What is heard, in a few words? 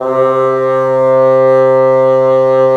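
wind bassoon; LA Composer vol. 3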